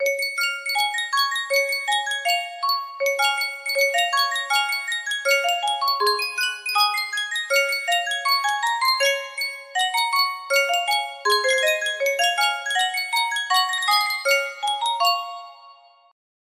Sankyo music box

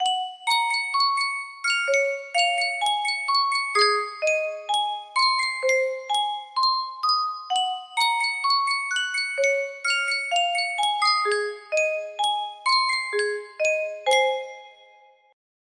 yunsheng music box, melody

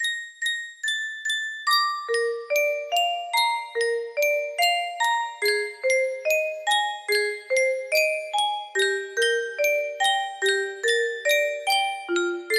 yunsheng music box, melody

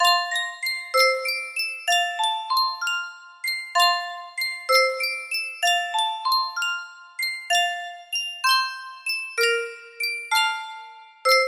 music box

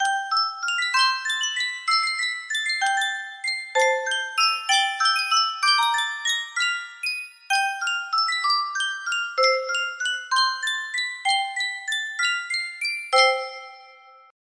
music box, The Dutch Organ, music box melody; Sankyo

